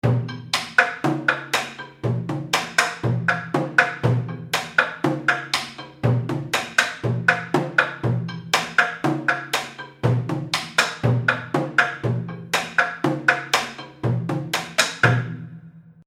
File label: African War Drums Seamless Loop; Musical Sound Effects